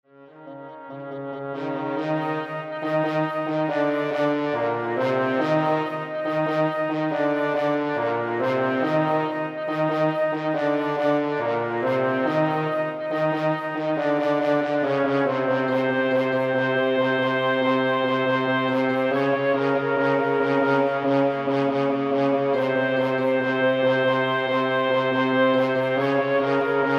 Woodwind Quintet

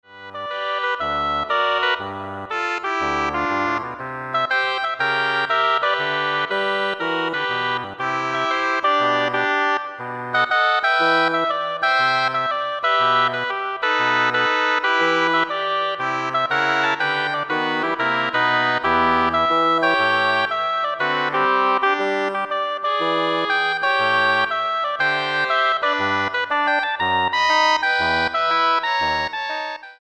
Double Reed Ensemble

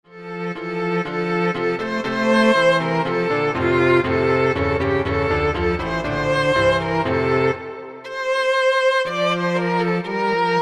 Piano Trio

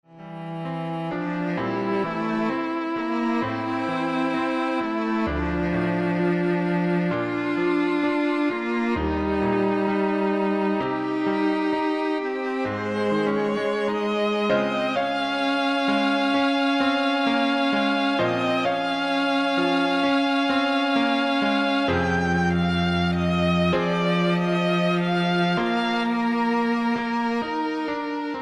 Piano Trio

